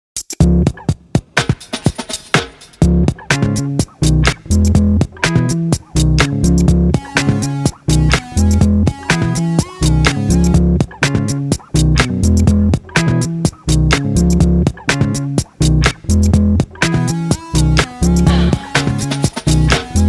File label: Shorty inst, electronic